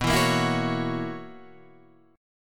B Major 7th Suspended 2nd Suspended 4th, down, Guitar, Half Step Down